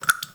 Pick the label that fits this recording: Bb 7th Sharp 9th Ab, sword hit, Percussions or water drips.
water drips